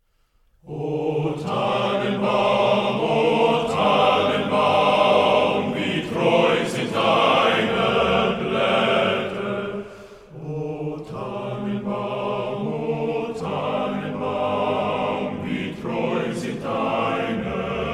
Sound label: o tannenbaum german us army band